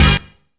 STEAMIN HIT; Zero-G; Funky Elements

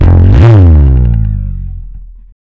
FUZZ SL, Bass Legends, SLIDES; Spectrasonics; ALL SLIDES

